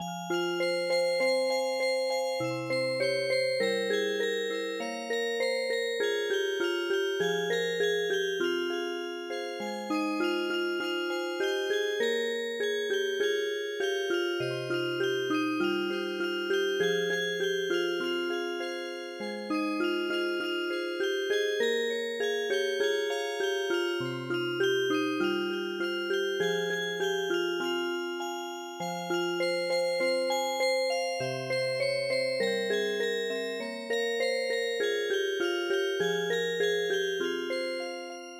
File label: Music Box, traditional